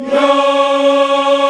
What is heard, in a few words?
C#4, CHOIR YAS, Africa; Spectrasonics; Heart of Africa 1